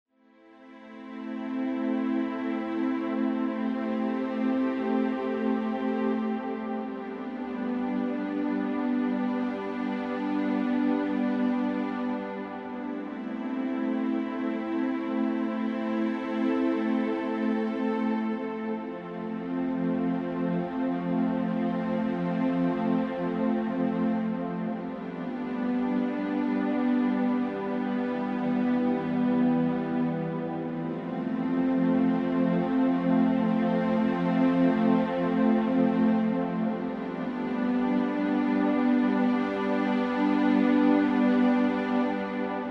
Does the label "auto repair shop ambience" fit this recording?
No